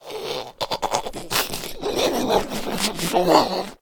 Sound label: idle, zombie; DayZ-Epoch; dayz sfx